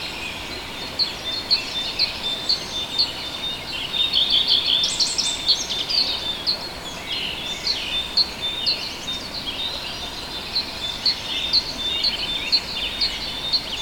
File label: early mountain birds habitat